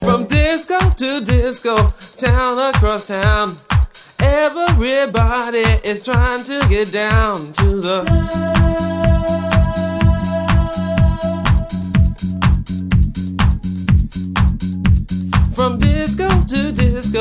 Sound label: ringtone